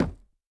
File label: break, chiseled bookshelf; block; minecraft